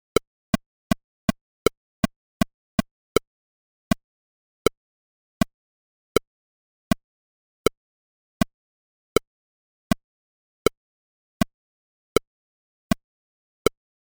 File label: Parody Click A